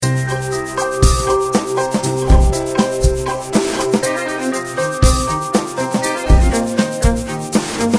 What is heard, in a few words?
victory, alarms; BenQ B502